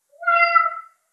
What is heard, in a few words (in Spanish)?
gato; Tres En Raya